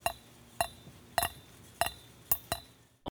water drip in empty pan small double drips; prosoundfx

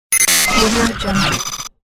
glitch

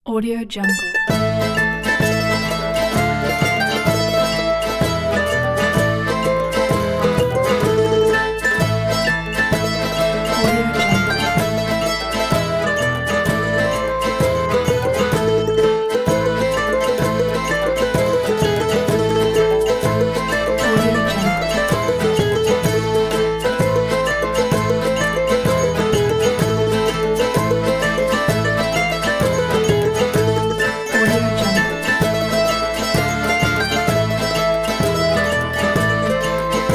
tarantella napoletana traditional italian

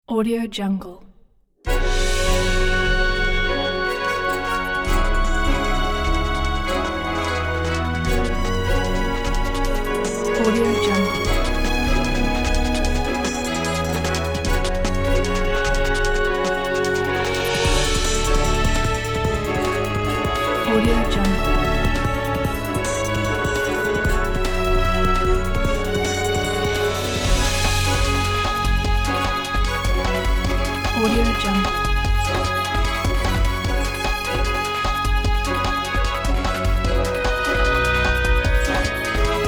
action or news; pangalmusicboutique

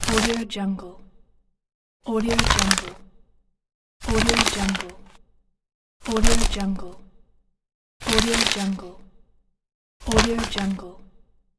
paper

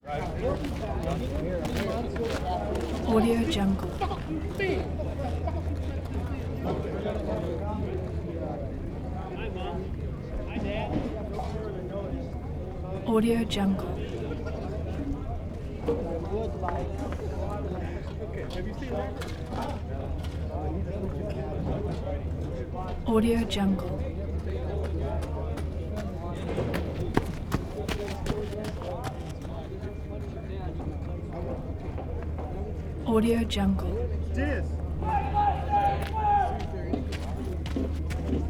walla national guard

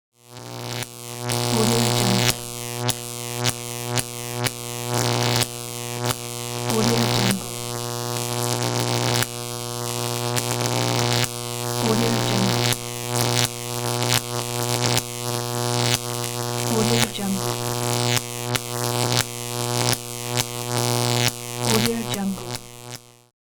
high voltage

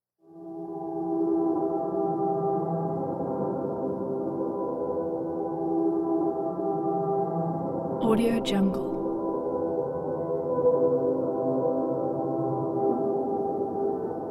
confusion in clouds atmosphere

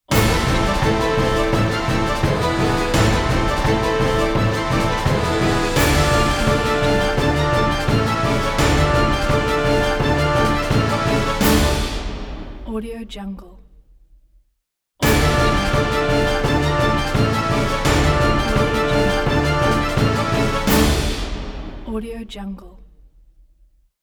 epic cinematic logo

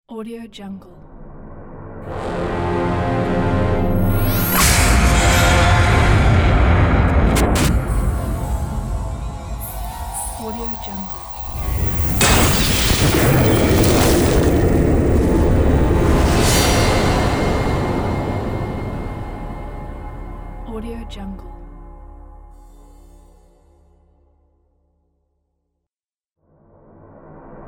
logo tension spirits impacts

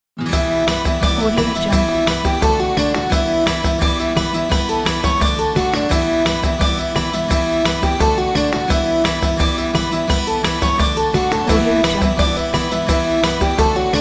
irish reel

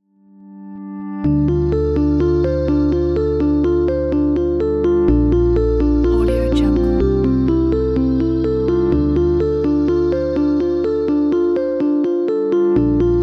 hitech works

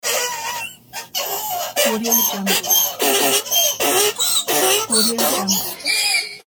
cartoon donkey

